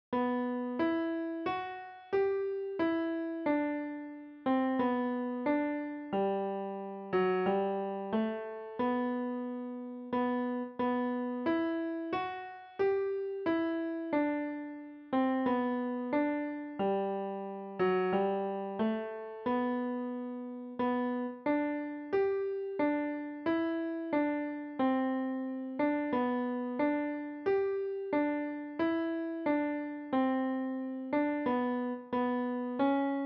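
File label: piano, für Klavier, sehr leicht; Weihnachtslied für Klavier solo, sehr leicht; Ich steh an deiner Krippe hier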